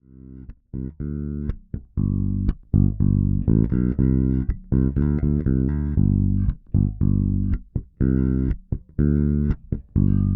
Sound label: Sadowsky classic Beat